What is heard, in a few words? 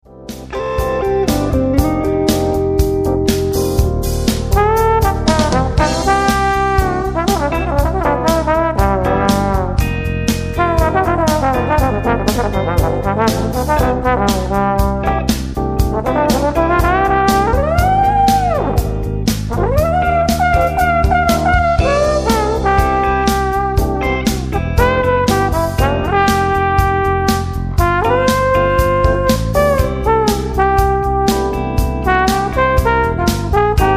Beautiful trombone solo; trombon solosu